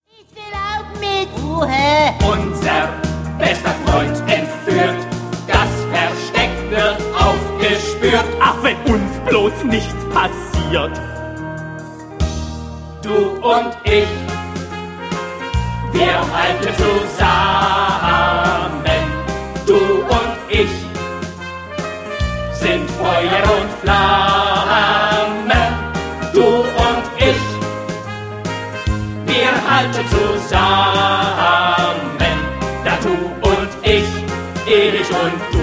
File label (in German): Marsch